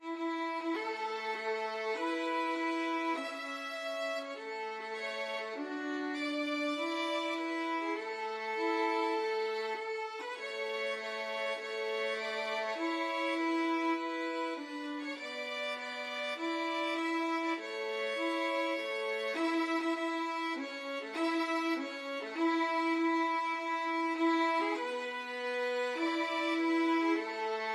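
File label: la marseillaise, violin duet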